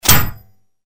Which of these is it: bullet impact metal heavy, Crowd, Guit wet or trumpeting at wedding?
bullet impact metal heavy